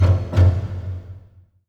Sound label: FULL ORCA C; East West; Symphonic Adventures